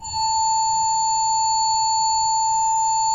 SWL A4, Cathedral Organ, SWELL WERK; Propeller Island

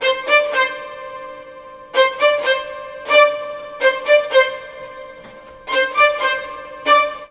STRG1, Roots Disco; USB Soundscan vol.32; AKAI

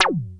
WASP; Masterbits; Vintage Voltage; SEQ-FX

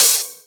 Open HH, Cymbals

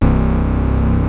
DEEP

